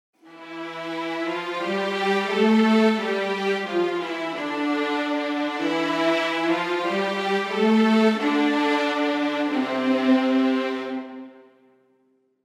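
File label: Pre Orch Ens 16 Violins 10 Violas 8va Legato; Audio Imperia Areia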